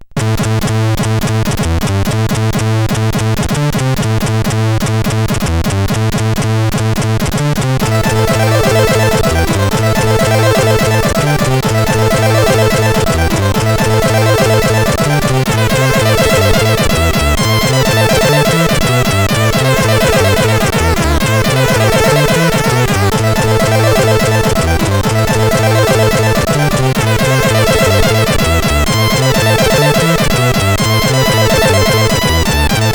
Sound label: sid, CSG8580R5